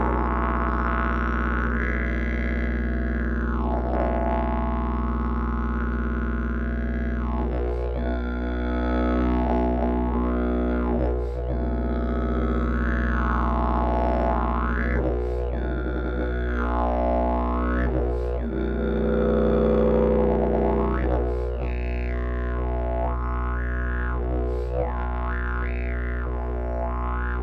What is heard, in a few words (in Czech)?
javor s drhadlem, didgeridoo